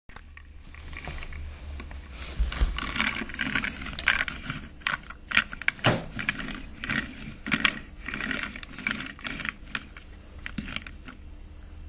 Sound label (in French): voiture